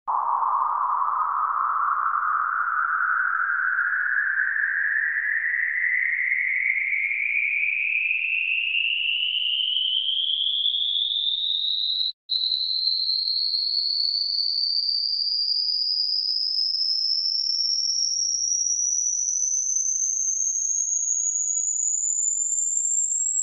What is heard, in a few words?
Sweep tones up in 1 octave steps- 1kHz-2kHz, 2kHz-4kHz, 4kHz-8kHz; Alan Parsons & Stephen Court - Sound Check 1993